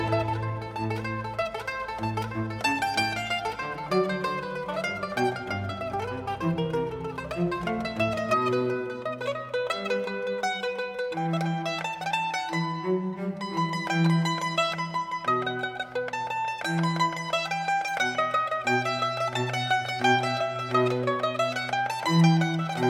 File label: mandolin concerto c major; vivaldi; rv 425; mvt1